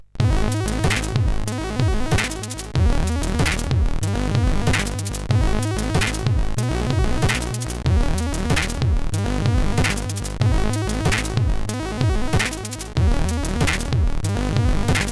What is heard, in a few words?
sid, CSG8580; hvsc